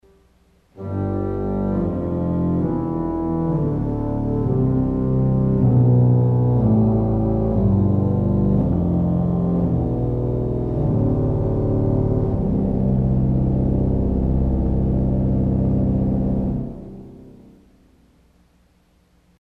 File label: Voix del Abime with other stops Descending Scale, organ; CulverAcademy